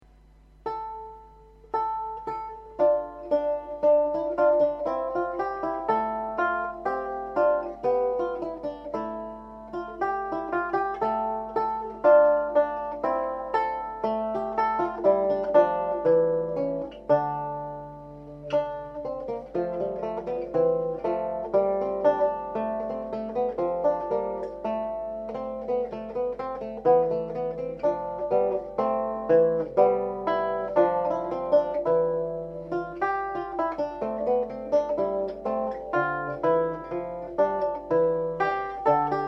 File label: lute Bicinia; psalm 96